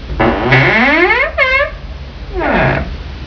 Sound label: door